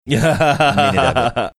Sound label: chuckling